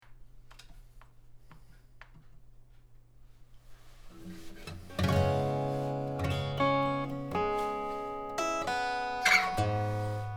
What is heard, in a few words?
only one world improv instr